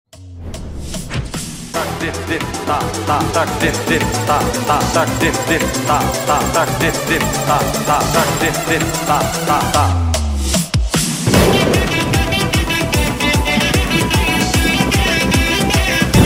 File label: bgm